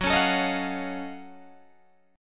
F sharp Minor Major 13th, Guitar DADGAD

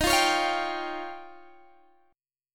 F 7th Suspended 2nd Sharp 5th

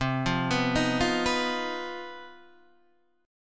C Minor Major 7th Double Flat 5th C; CmM7bb5 Chord {8 8 9 8 6 7} arpeggiated

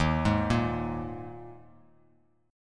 D sharp Suspended 4th, Bass Standard; arpeggiated; chord; x,6,6,3; JGuitar

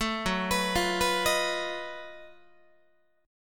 Gb Minor Major 13th Gb down Guitar; arpeggiated; JGuitar

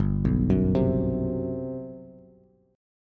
G Major 11th, Bass; arpeggiated; JGuitar

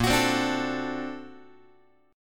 Bb Augmented Major 9th Bb, Guitar; Bb+M9 strummed; JGuitar

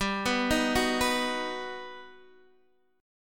G Augmented Major 7th

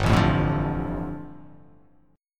G 7th Suspended 2nd

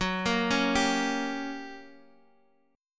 G Minor Major 7th Flat 5th, Gb; GmM7b5/Gb arpeggiated

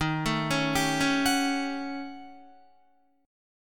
Eb 7th Sharp 9th Flat 5th, Eb, Guitar; Eb7#9b5 arpeggiated; 11,12,11,12,13,13; JGuitar

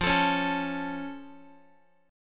F Augmented F Guitar; strummed

